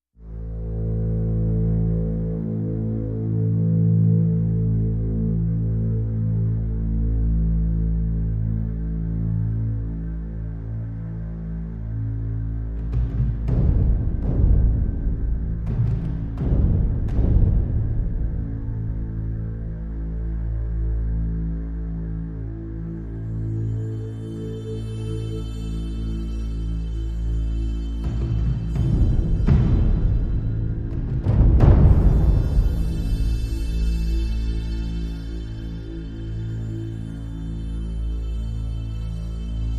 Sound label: Aminor no guitar